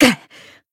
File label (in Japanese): Voice damaged jp